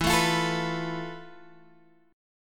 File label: F Major 7th Flat 5th E, guitar chord